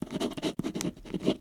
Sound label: Write on Paper with Pen, Office Supplies; 1001 Sound Effects